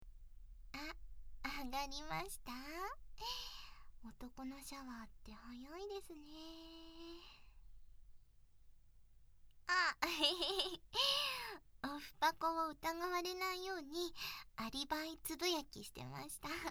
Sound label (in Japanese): いじわる耳舐め